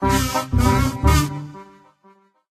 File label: Egg Stinger; Angry Birds 2